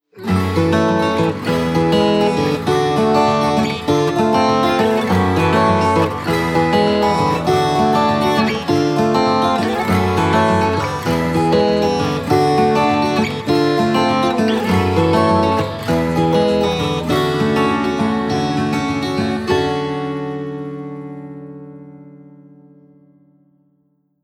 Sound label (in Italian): ACUSTICHE NASH